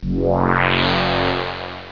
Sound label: majorbonus; Ferazel's Wand Demo